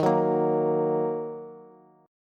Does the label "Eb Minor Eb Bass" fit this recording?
Yes